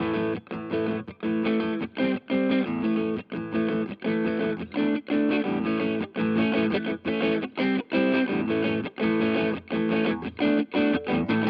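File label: Hagstrom Crunch Both, guitar; Pat Smear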